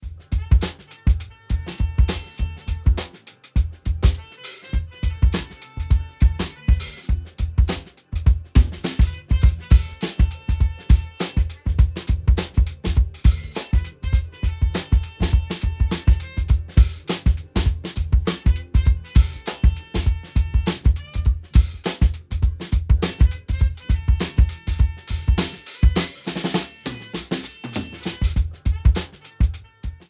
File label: Drum Buss Drive100 Boom50; Ableton